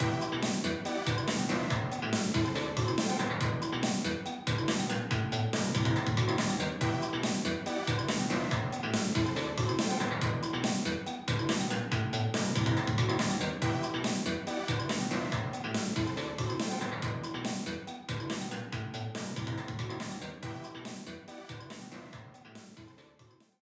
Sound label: BGM Mission Stage; Splatoon 3